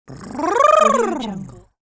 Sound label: comical vocal purr